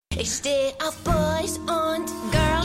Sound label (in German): Gesang; Schmigadoon; Molly